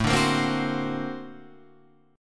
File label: Bb Augmented Major 9th A